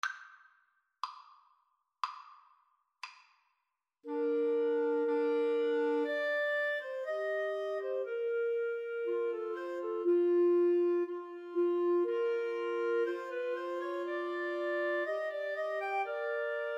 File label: Clarinet Trio